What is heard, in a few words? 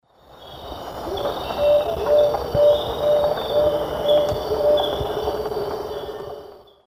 Megascops watsonii, call; S. America; Eastern Lowlands